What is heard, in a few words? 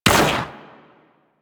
Fighter Fire; Warframe; DE; Vehicles; RailJack; RailJackEnemyShips; Grineer; Space Fighter Rager Agent